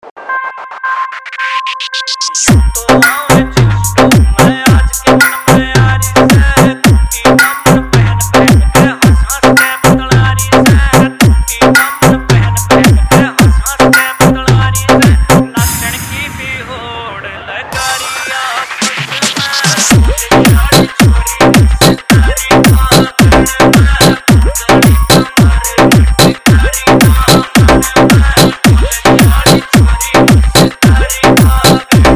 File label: Hard Remix Haryanvi Dj Song